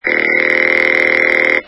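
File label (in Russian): гудок как пропеллер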